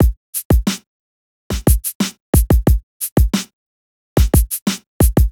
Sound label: Drums, 090 Cmin; Hip Hop; Hip Hop Exotica; Apple Loops; Big Fish Audio